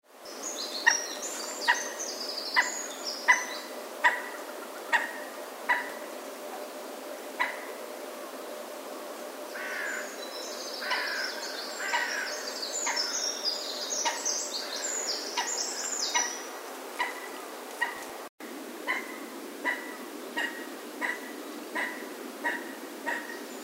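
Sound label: common moorhen voice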